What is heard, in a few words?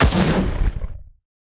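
black cannon overload, attack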